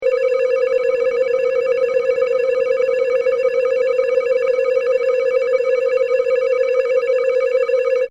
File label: SciFi Scanner; Communication Sound Effects